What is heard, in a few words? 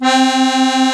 musette C3, accordion